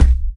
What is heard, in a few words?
step; doom3; archvile